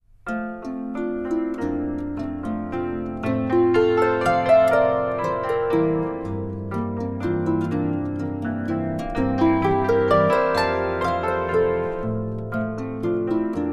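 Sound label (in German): Landler, Harfe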